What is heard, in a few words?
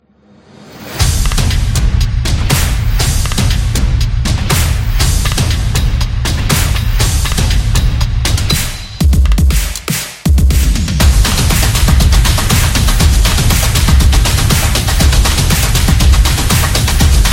Drum